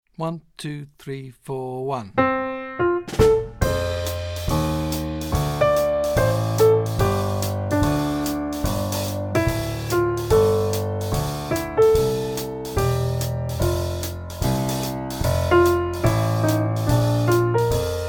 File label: syncopated melody, teclado; jazz iniciante